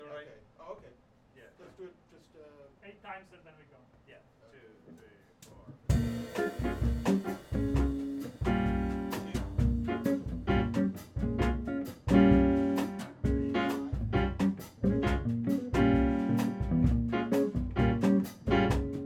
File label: triste